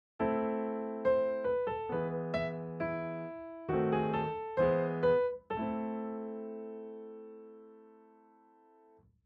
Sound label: Requiem melodic